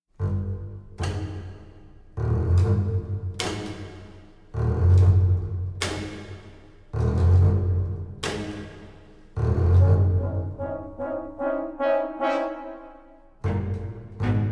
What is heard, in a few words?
String; assassin s creed unity gamerip 2014